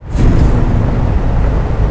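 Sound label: Table Raise start; theed; XBOX; Star Wars Obi-Wan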